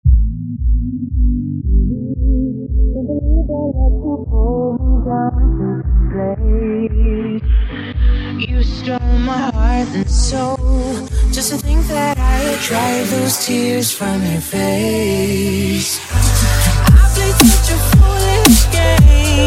remix

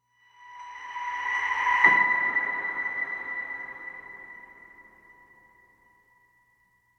piano ping rev B

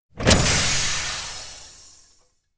pneumatic

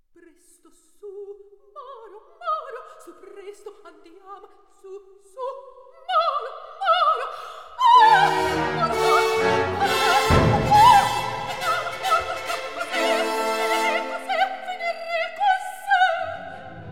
male chorus; act iii; tosca; spoletta

